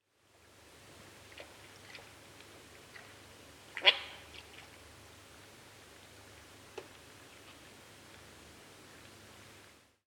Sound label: Black tailed nativehen